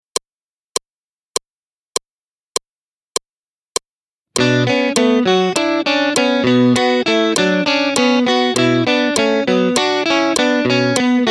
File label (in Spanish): ejemplo 100 bpm, guitar